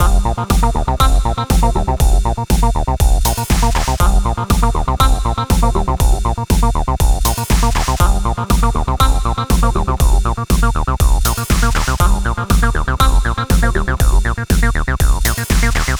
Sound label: Simple Bass with drums